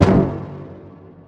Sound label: brass